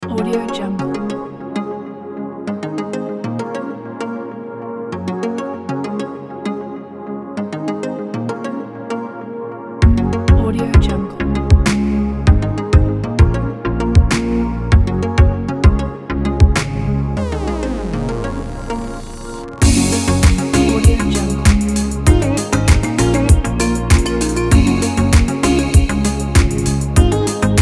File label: tropical neon